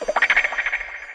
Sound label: guitar reverb